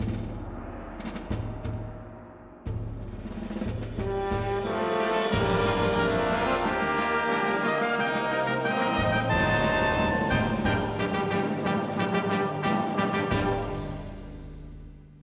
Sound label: Fanfare of Revival